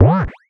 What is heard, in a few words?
Synth Boing